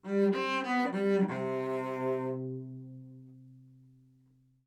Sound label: live string phrases, string phrase Cmaj 100bpm